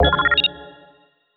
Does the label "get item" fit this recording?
Yes